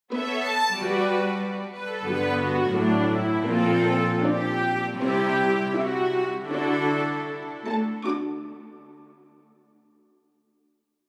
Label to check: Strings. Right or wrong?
Right